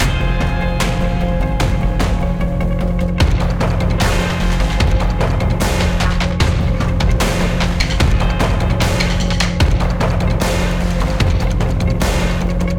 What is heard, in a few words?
Bossbattle; Gl Rook; Griftlands